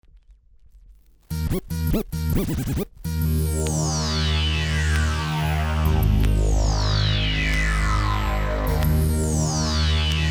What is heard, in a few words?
Bouncy Techno Mix